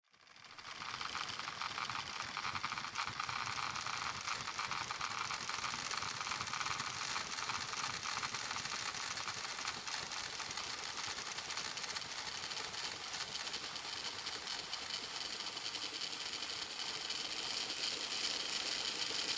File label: Mass insect scuttling sounds.; Bug Infestation; Insects (Sound Effects:Animals)